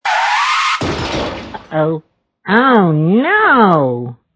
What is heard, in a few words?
Crash; midi